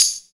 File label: Tambourine, Percussion